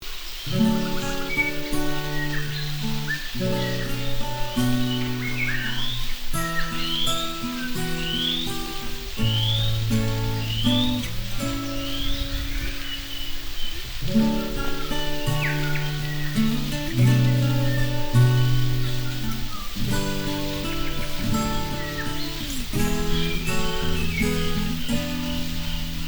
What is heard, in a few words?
Pan Flute birds